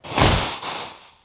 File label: Serpent Spell Sound